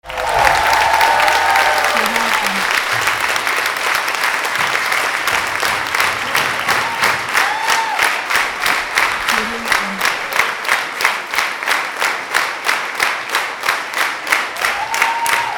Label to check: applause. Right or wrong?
Right